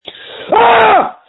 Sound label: Scream